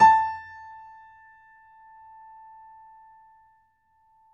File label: piano; East West; The Ultimate Piano Collection